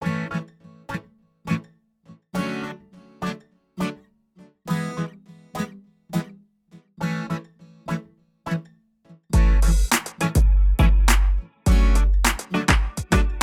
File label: I IV V I Pop A